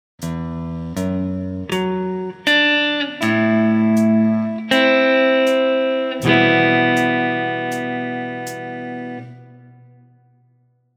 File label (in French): Rythme